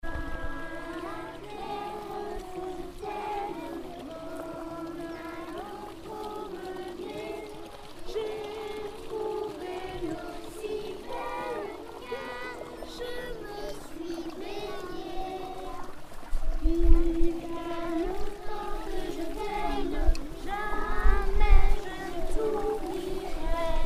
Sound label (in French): Lavoir Claire fontaine